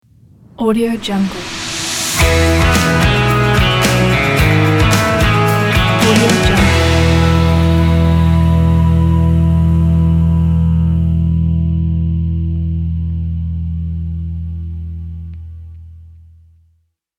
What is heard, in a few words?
upbeat positive intro ident; imakemusic